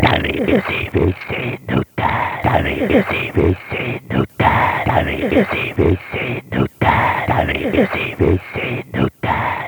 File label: growling; caulk legal jolt parodies an ID that's gone can't find audio from original